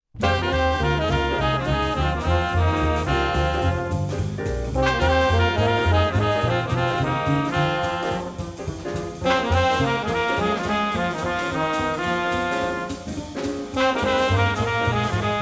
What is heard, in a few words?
blues minor